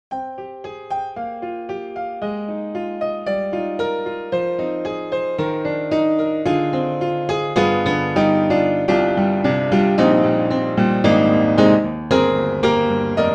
Upright Pianos Modern Upright Modern Studio Upright II; Synthogy; Ivory II